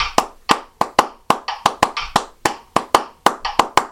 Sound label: reversed clapped; 12 claves